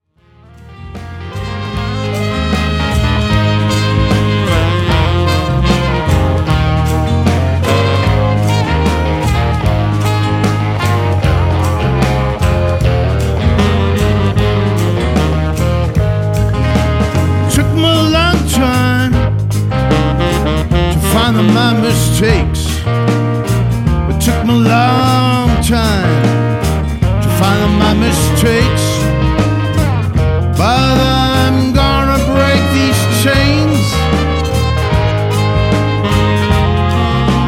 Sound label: RootsRock